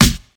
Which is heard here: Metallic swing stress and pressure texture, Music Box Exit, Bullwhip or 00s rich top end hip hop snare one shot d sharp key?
00s rich top end hip hop snare one shot d sharp key